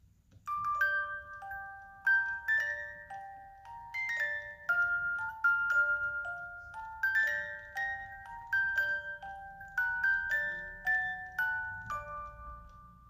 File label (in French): 18 lames, boite a musique